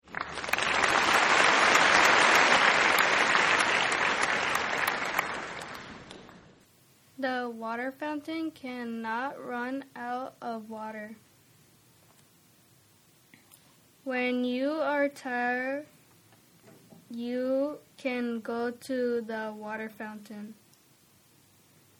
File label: applause